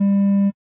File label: Aircrafts Cockpits RWR Track; DCS